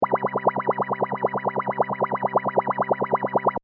Space fx loop, Spaceship Environment Style Loop, Short; Sci Fi; Stuart Duffield